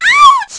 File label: crashvoice; PLAYER VOICE; Nintendo; July 2020 Gigaleak